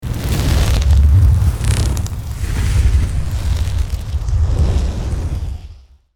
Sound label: Flamethrower shooting fire long burst